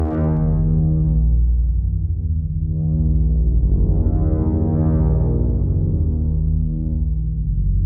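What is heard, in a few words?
122 BPM Horn B major G minor Tonic D; mmb studio soundtrack essentials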